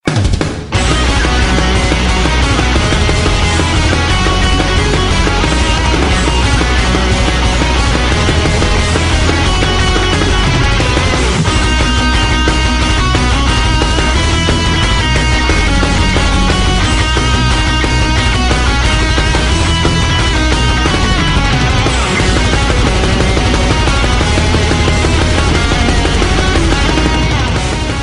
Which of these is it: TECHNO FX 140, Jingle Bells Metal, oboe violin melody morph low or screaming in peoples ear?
Jingle Bells Metal